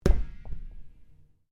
Ball Swiss Bounce Slap Hand, Large Swiss excesize ball; Equipment; Sports